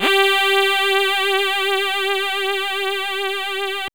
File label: PAD R; AMG - Vince Clarke Lucky Bastard